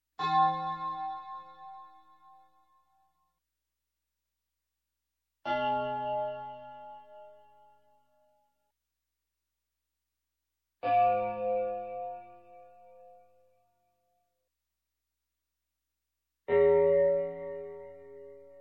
Chimes single Hit, Music, Percussion; Sound Ideas; Series 2000

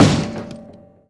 Cello Smash; SFX Kit; Foley - Misc

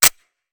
Musket Foley; Sound Ideas; Guns

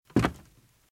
Footsteps - Wood - Creaky - Walk - Right

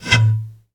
Blow Bottle Mortar Shot; Weapons

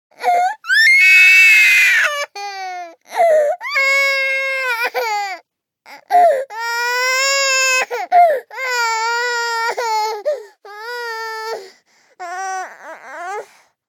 BABIES CRY Girl 24 months High; Baby Boom

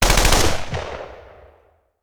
Machine Gun; Sound Ideas; Guns

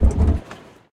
car rattle; Blind Drive

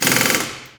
car change wheel, street traffic; best service Blue Box

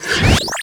Pickup Scifi Energy; SFX Kit

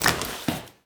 Bodyfall Tile; SFX Kit; Bodyfalls

